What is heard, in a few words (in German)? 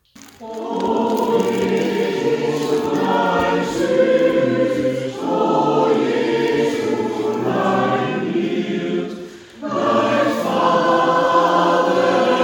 O Jesulein Suss Tutti